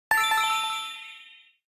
item get; anemoi

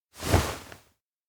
bow two; anemoi

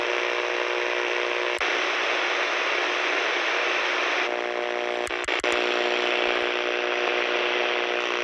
radio channel loop; anemoi

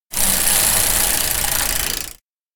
row; anemoi

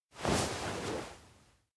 sleep bed; awayuki

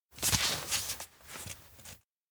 try open letter tremble; koyomi; anemoi パッケージ版

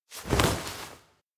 catch; aino; anemoi

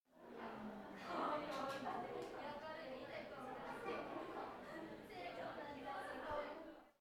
crowd rustle; anemoi

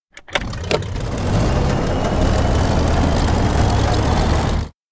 elec plane engine start; anemoi

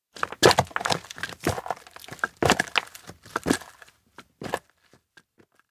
steps outdoor hut enter rubble; ken; anemoi

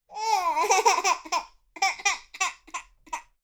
baby voice; anemoi

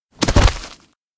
stone big place grassland; anemoi

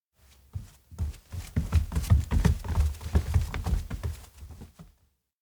steps indoor log house enter kids; anemoi

